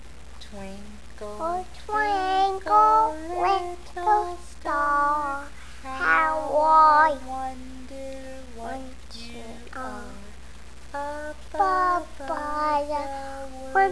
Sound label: twinkle